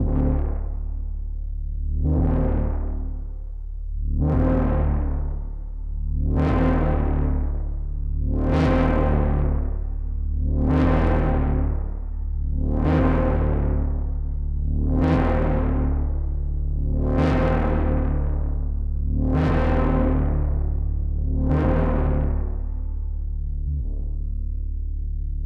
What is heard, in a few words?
Doppel Move Pulse D 111bpm